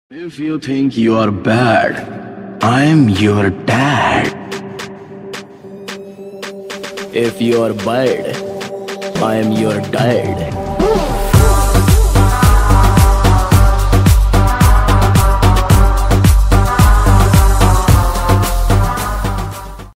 attitude ringtone bgm ringtone; kgf

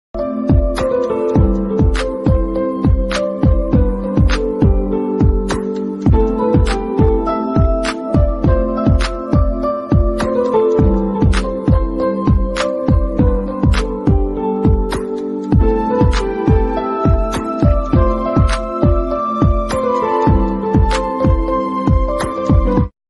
love failure bgm ringtone, tamil ringtone

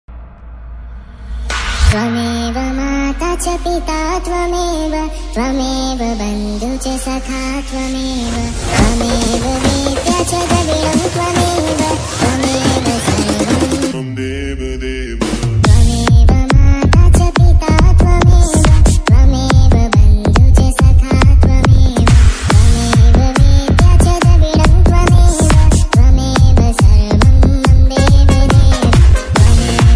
trance music tone; twameva mata cha pita twameva

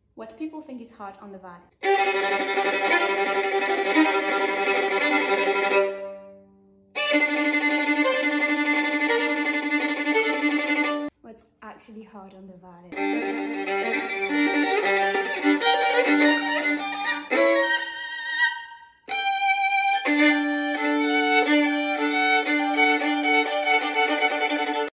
violin

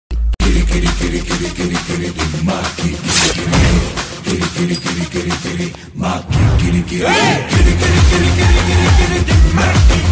bgm ringtone telugu; double ismart; rampothineni; purijagannath

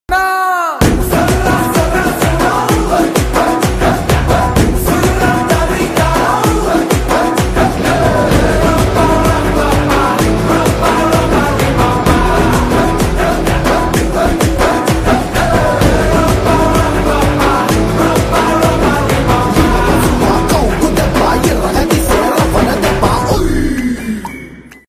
Telugu BGM ringtone; gunturkaaram; mj

